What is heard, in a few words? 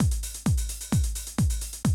130, Classic DrumLoops